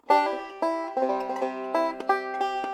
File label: pick